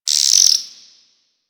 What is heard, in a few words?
Cave Insect; Ambience; One Offs; Insects; Warframe; Quests; The New War; Drifter Camp